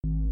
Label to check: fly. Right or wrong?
Right